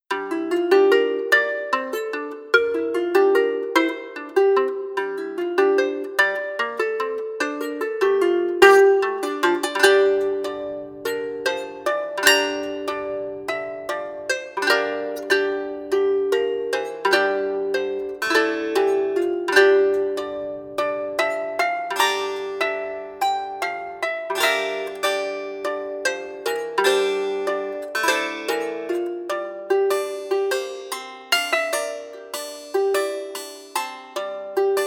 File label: strumstick naked